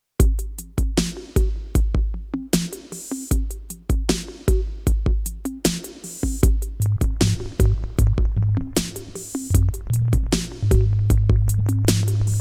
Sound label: Prelude; hyper terrestrial; Infectious